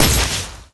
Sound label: sword far